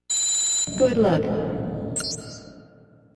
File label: Good Noise